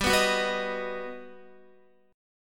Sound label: G Augmented Major 7th, Guitar; G+M7 strummed; JGuitar